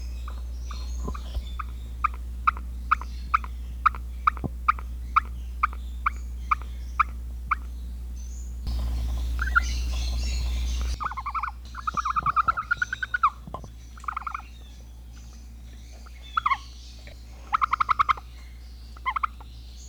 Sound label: Struthio camelus, Ostrich